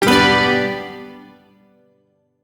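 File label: Shawzin Major Chord; Warframe; Duviri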